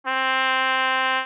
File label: trumpet